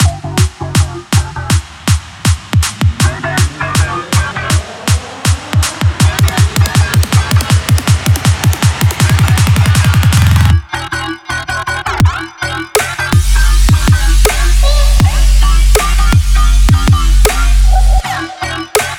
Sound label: 80 Emin